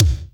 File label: BD, DR BD; Best Service Gigapack 2